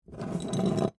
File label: Skeleton Key Up, Pick; Foley; Jangling